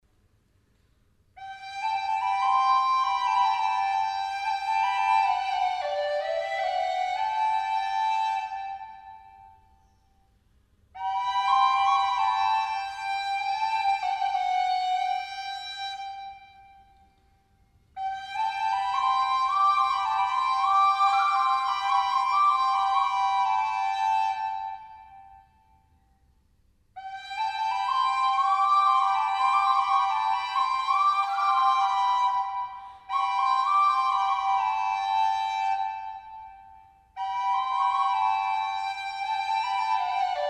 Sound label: double flute